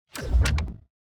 environment successful build low

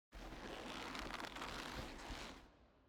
ambience room torture tripalium leather

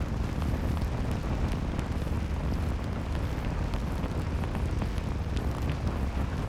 Fire Sphere Fly Loop; TANK; 3Souls

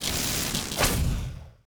electric golem strike

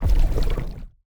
Crossbow Water Choice UI; TANK; 3Souls

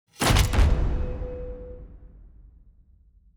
arena start battle

